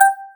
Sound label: prompt single high; IQ.Pilot; selfdrive